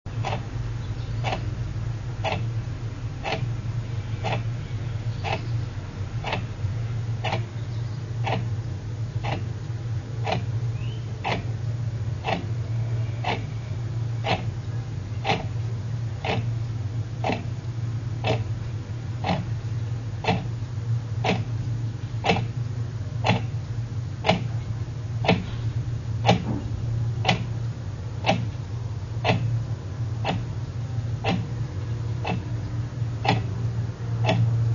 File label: clock, L' horloge